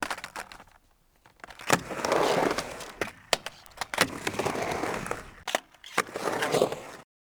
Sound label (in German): skateboard, abmischen mixing; tutorial; musikproduktion